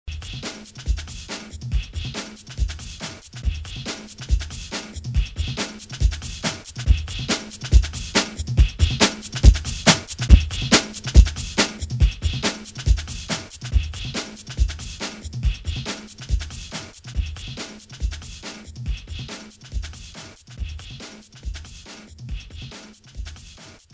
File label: attack